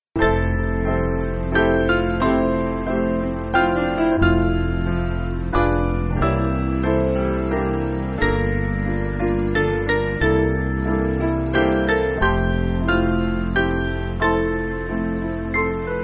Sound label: Piano